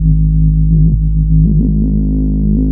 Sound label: combat scan loop, scanner; hl2